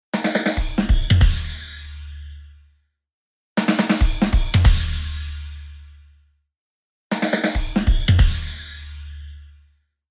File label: Drums Wide Phaser; H3000 Factory Mk II; Preset: Wide Phaser